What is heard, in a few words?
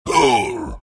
pain; beastmaster